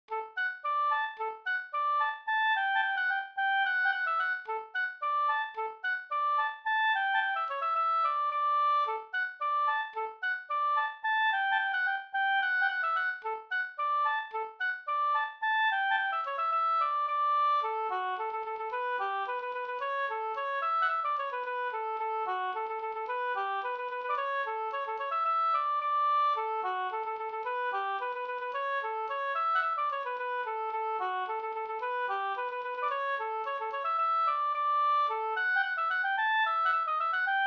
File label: polka, polketten; Ydrepolketten Og Orig